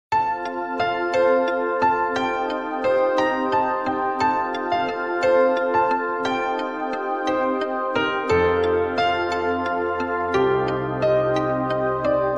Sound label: cool tone ringtone